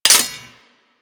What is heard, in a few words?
Dagger Metal Impact